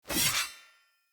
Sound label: Knight Axe Whoosh Stab; Weapons; Enemy; Axes; Snc Knight Axe